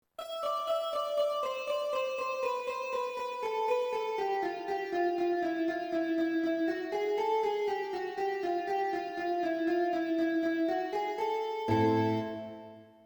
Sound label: ciganochka solo